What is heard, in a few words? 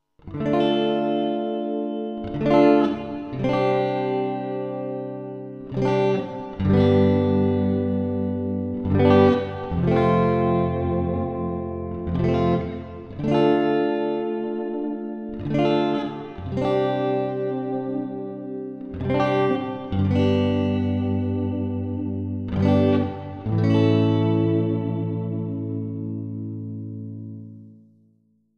vintage chamber; magix; packplug